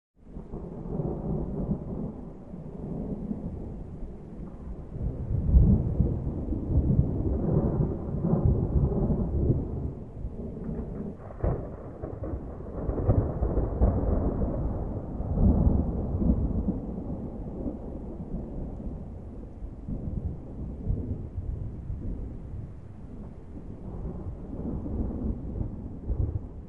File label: Thunder Approach, distant